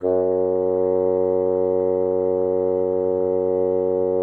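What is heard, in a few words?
bassoon piano F#3; Xsample VOL-6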